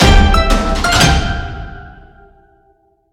Music Effect Bell Voice Positive Stereo (Con Percusion)